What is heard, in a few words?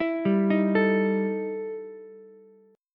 E Minor Major 11th E Ukulele C6; EmM11 arpeggiated